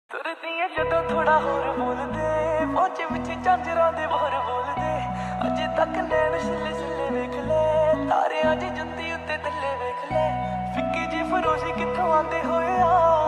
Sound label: lofi ringtone